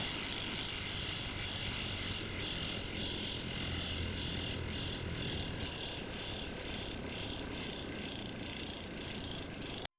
biker riding

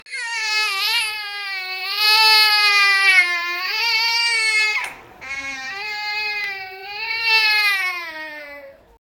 child crying

